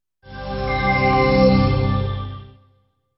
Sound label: PC Transmission Sound; dot hack